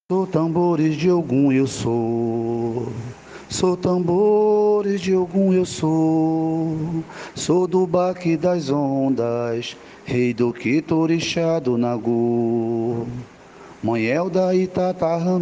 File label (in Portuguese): Tambores VOZ, Maracatu